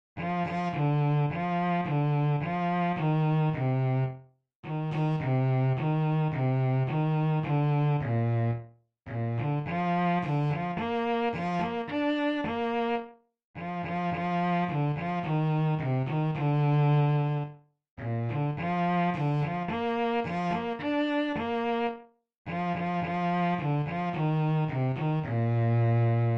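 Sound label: Bass, Chamber String Orchestra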